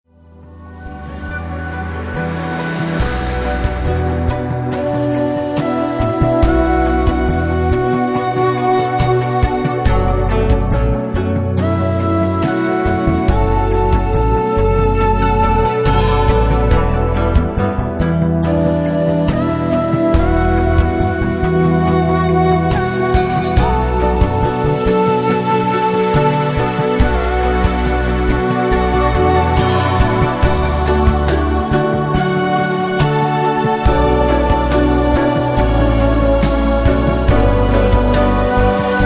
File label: Japanese Garden